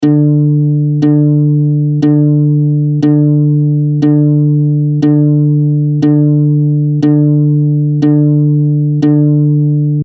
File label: DGBE D, uke